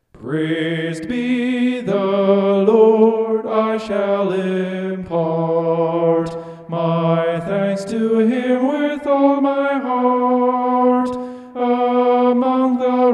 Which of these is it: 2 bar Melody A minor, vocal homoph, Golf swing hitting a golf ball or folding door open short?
vocal homoph